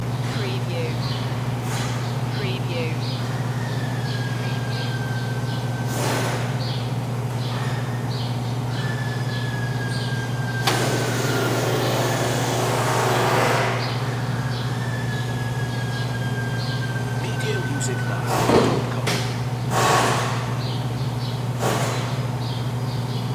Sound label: Auto Body Shop